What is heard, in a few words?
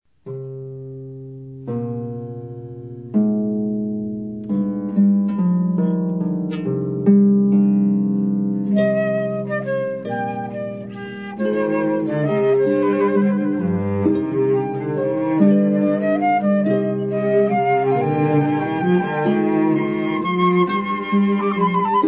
Trio, harp; classical; Purcell: Chaconne For Flutes